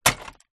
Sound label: impact misc trash glass hard